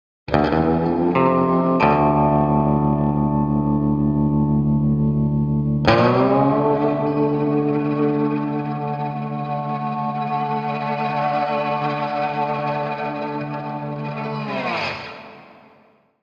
Electric Guitar Twang - Texas Melody, (Slide Guitar); Hollywood Edge; sound designer's toolkit v2